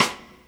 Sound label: Rimshot, Snares